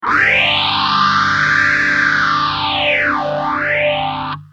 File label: gtr ibanez ph7